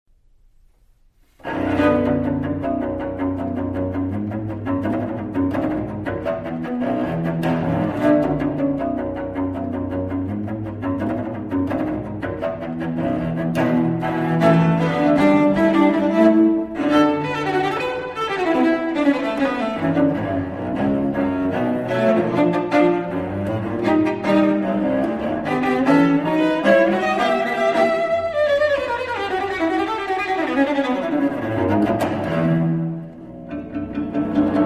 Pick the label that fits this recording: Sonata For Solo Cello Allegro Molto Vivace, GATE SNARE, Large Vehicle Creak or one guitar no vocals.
Sonata For Solo Cello Allegro Molto Vivace